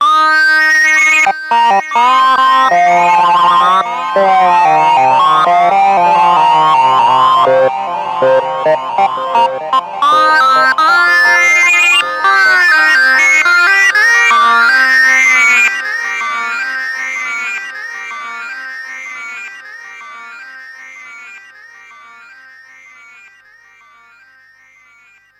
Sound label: Sync Lead, tc electronic Flashback X4